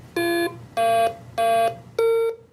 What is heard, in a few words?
simon game intro